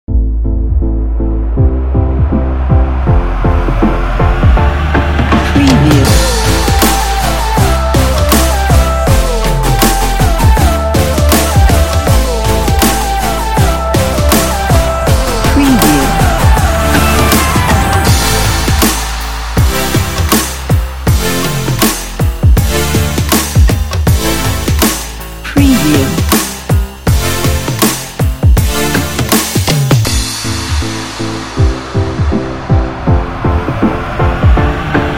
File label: trendy future bass